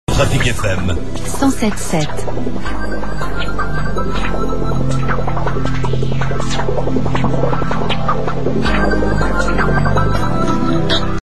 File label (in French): Radio Ancien Top horaire; Trafic FM